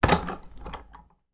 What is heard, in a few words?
household hanging lightshade hit and swing